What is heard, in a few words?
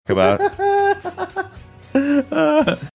cute laugh